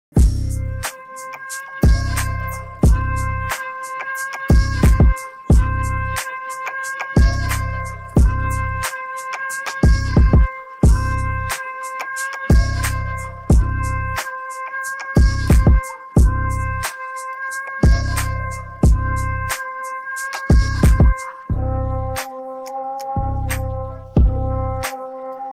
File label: instrumental; persian